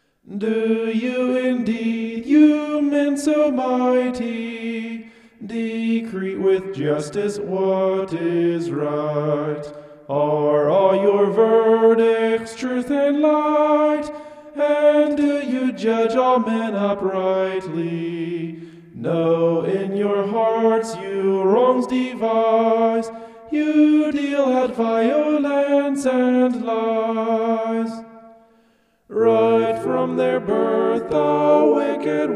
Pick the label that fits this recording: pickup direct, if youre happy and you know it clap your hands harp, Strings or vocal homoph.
vocal homoph